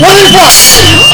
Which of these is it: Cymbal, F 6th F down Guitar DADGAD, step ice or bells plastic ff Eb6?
Cymbal